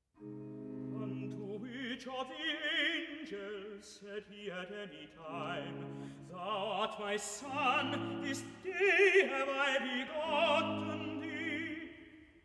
Recitativo (Tenor) "Unto which of the angels said He; Messiah